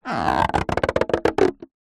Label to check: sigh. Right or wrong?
Wrong